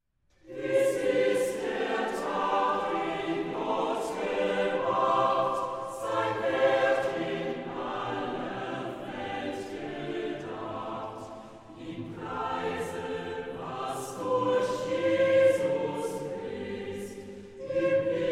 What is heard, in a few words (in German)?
Orgel, Bach-Chor; Bach-Chor Siegen